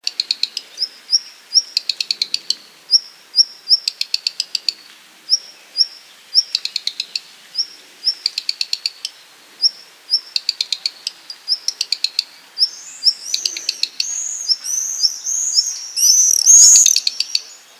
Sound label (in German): Hausrotschwanz Ruf Alarmruf